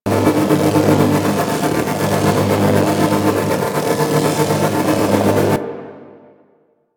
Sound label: floor loop; Warframe; Ambience; Hub; Tenno Junction Relay